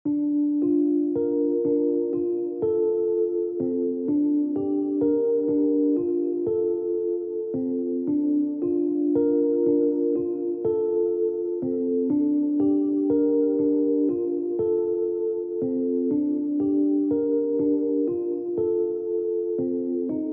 Lounge Rhodes

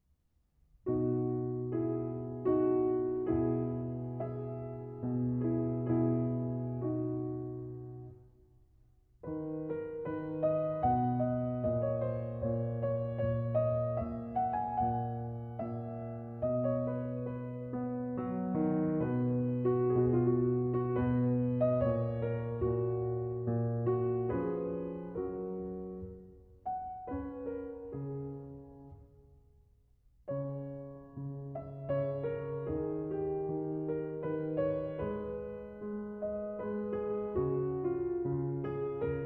Keyboard Sonata in B Minor, Adagio Non Molto

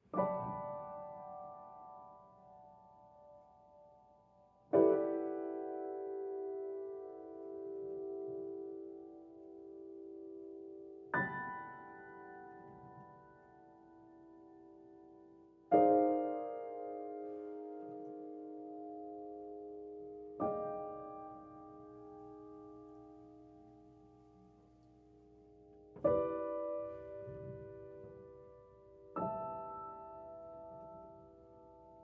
Improvisation

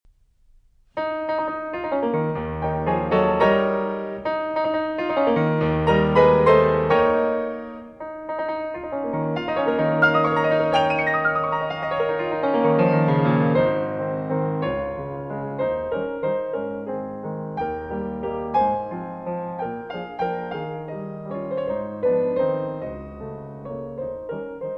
Waltzes, Vivace in A-Flat Major

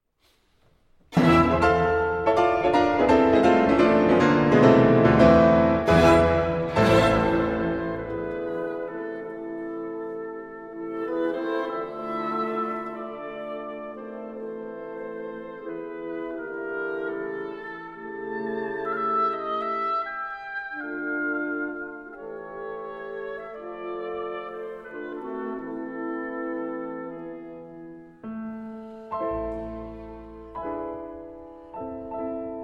Piano Concerto in A Minor; Op. 54; Schumann

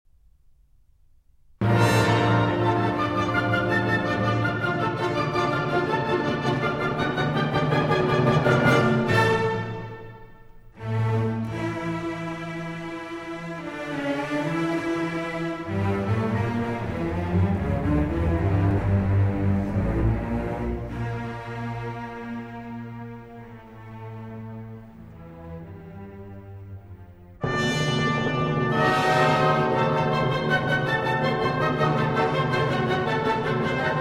Symphony in D Minor Choral; Beethoven; Op. 125

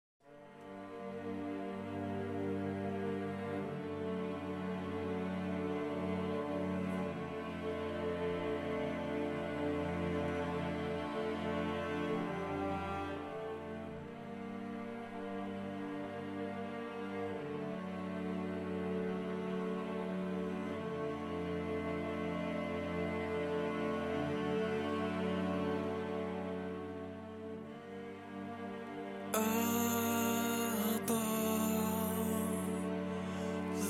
(Orchestral Version)

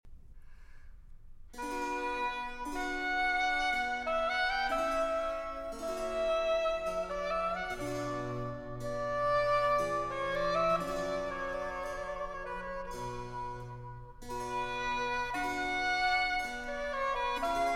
Oboe Concerto in C Major

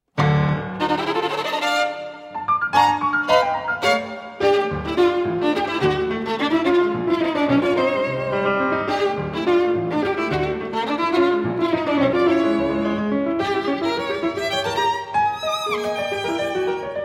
Sonate Pour Violon Et Piano Allegro Con Fuoco; Poulenc

